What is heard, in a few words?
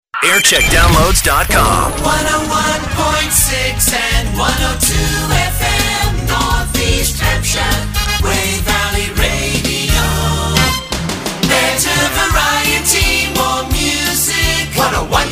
jingle montage; wey valley radio; 1995